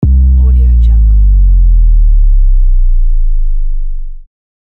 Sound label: bass drop